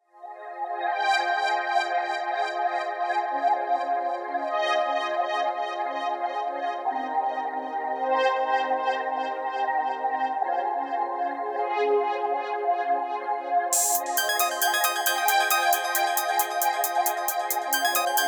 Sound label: juno106